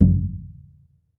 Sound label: TOLOWA DR, American Natives; E-MU Formula 4000 Series Vol. 4; Earth Tones